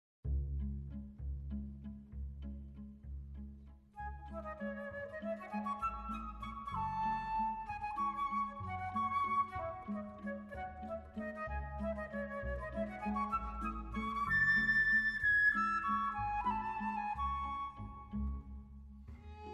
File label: Waltz